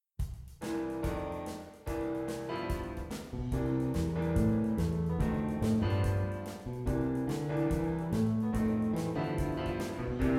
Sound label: SWINGTONE for Jazz Ensemble